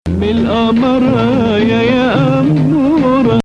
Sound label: Nahawand